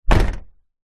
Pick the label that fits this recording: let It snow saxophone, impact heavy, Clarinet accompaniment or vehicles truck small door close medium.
vehicles truck small door close medium